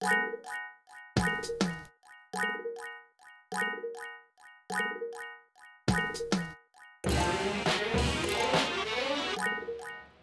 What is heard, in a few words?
Melody